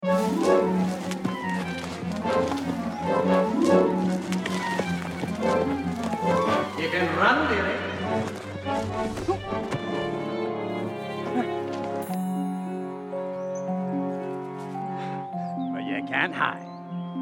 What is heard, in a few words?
Magic and Fantasy Scene w Dialogue